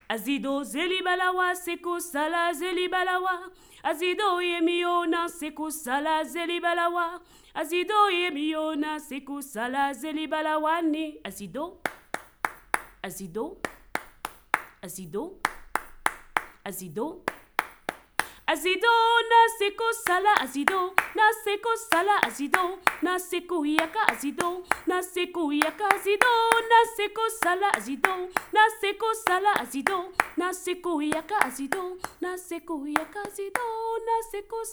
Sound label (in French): VOIX AZIDO